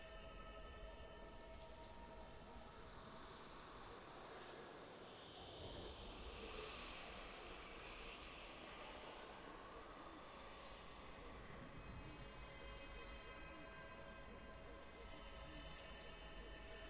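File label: ambience ionia altar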